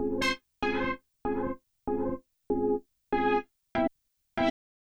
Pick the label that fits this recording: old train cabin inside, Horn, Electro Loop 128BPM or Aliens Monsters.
Horn